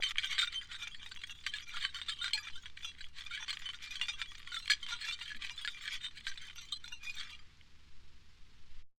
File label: Metal Clanging